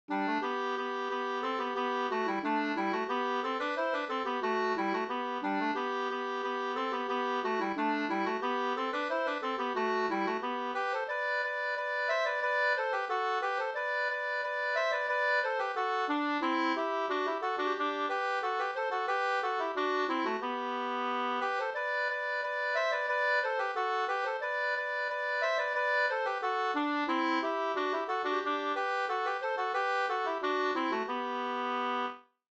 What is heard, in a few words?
polka